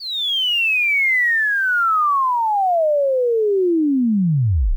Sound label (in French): gliss electro desc